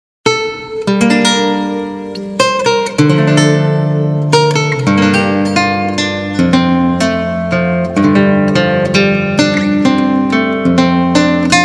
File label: lute instrumental